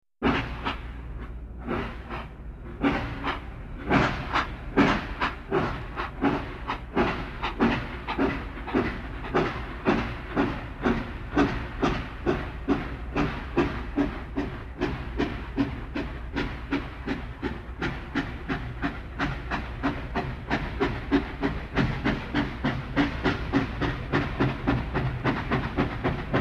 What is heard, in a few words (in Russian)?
lokomotiv parovoza nachinaet dvizhenie